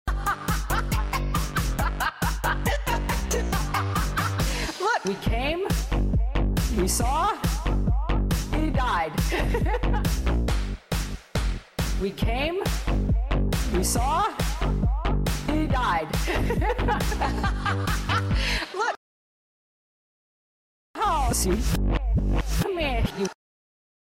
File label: LAUGHING SONGIFIED; HILLARY; KILLING GADAFFI; jrshow